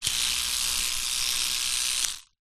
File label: household electric toothbrush switch on run short off